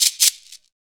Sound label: SHAKER, Afro Shakers, Shake&Rattle